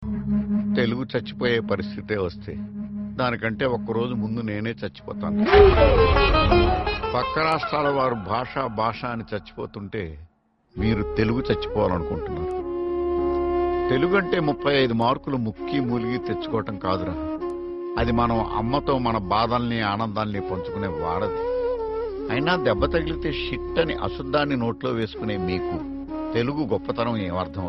tamil sad song

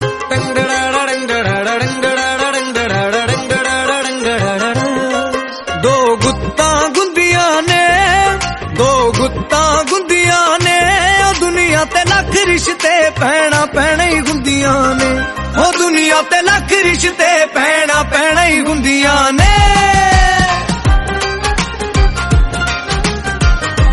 tappe, punjabi song

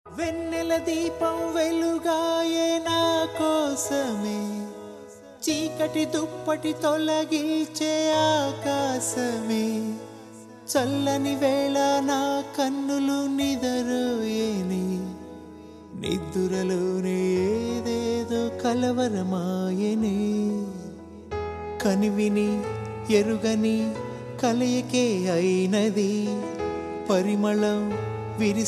telugu ringtone

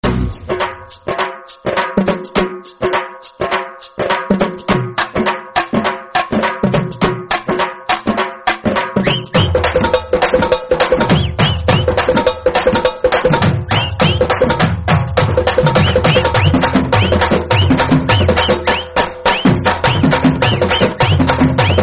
brother love songs in telugu

